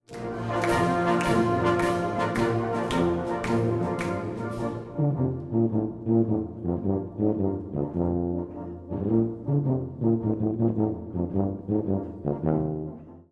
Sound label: Tuba; Smarties